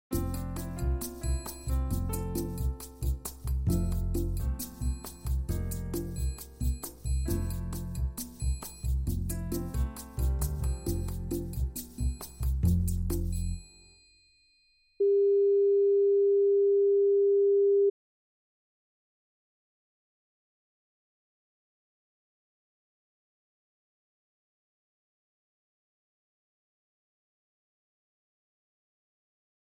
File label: Jazzy Fill